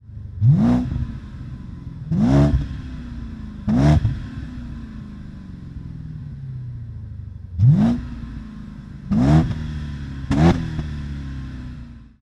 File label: exhaust, bmw 550i e60; 535; bj06 270kw